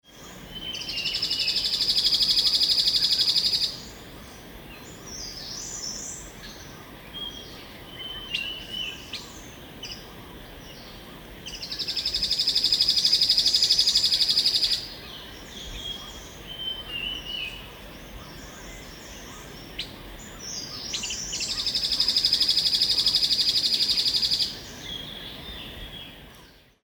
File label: beautiful nuthatch